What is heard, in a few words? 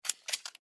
camera